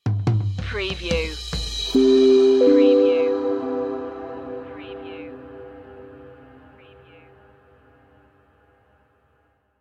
emergent lights stinger

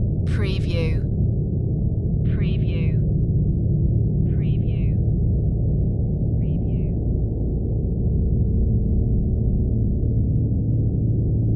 dark space flight drone; loop

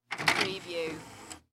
DVD Player Tray Open

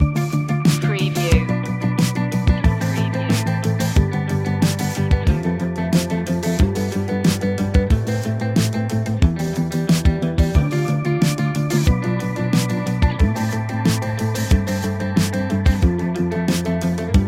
analog epic loop

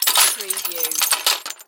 Debris Glass Drop

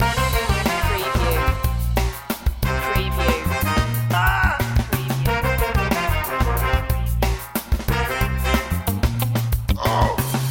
sax machine loop

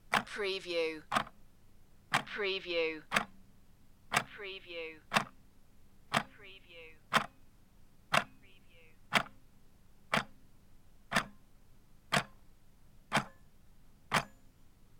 Old Clock Ticking; Type 2 Fifteen Seconds

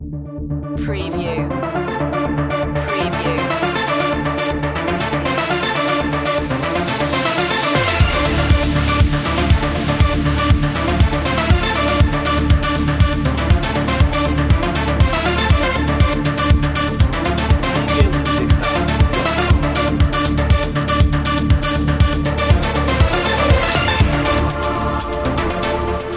arp

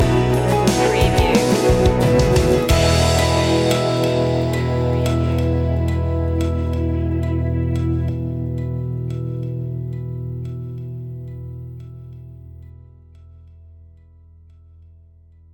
acoustic driving day stinger

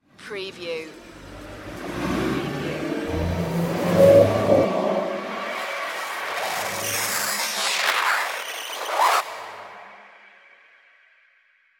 futuristic voltage glitch

